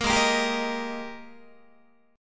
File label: G sharp Major 7th Suspended 2nd Guitar; 13,16,13,17,14,14; JGuitar